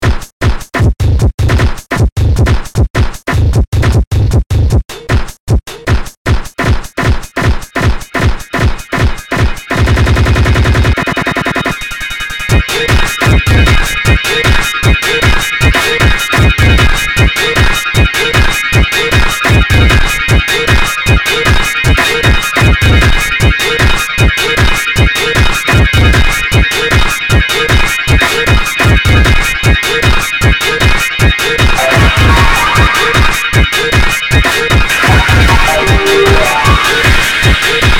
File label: Noise Techno